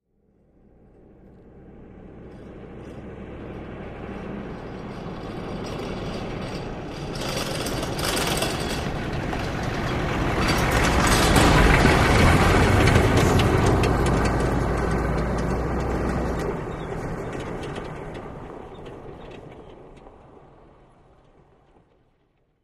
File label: Humvee Slow By Rattle